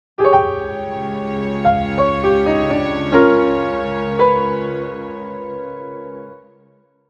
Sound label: fuon